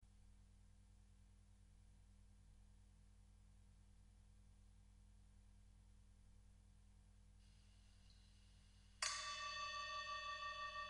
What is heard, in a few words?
parrots